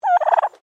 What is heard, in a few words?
Bird Turkey Domestic Call; Birds (Sound Effects:Animals)